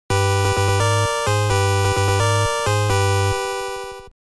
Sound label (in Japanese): オープニング